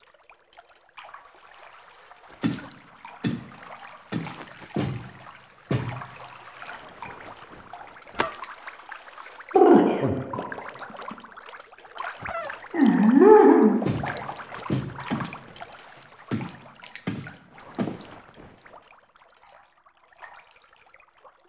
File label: Hug Foley; Entrati Labs; Cavia Hug Cinematics; Fibonacci